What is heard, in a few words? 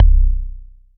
eek, Kicks Heartbeats